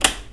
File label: on off, Switch FX; Masterbits